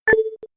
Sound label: beep; SSV-Music